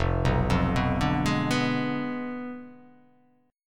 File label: F Diminished 7th F down